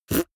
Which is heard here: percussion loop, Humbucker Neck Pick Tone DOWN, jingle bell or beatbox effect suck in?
beatbox effect suck in